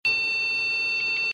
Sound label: beep